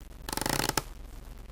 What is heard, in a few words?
floor squeak